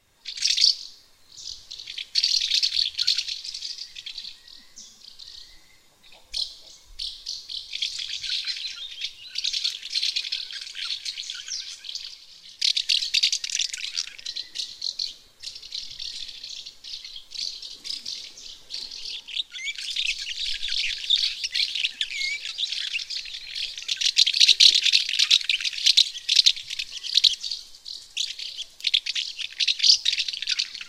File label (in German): mehlschwalbe